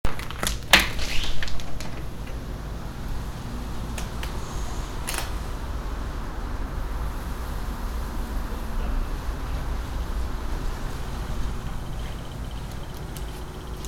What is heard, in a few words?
Going outside, binaural